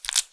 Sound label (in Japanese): くちゅ音 単発